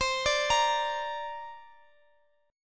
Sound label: C 7th Suspended 2nd, Guitar, Open D